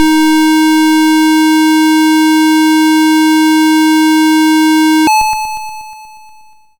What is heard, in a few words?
YM Synth D#; SYNTHS; CHIPSHOP DELUXE; CHIPSHOP HITS